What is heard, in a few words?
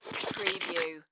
Slurp Fast Male; sound effects bible